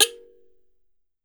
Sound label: KALIMBA